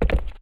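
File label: gritty cement land, footsteps; chief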